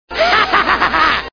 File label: laugh